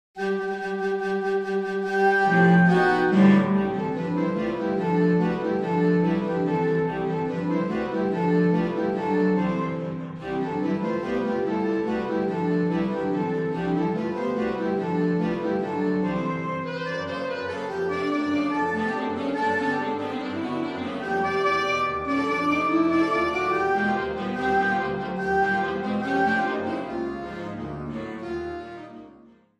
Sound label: Suite for Saxophones Galop; Stravinsky